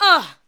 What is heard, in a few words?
ADLIBS 90; Ueberschall; Jam Box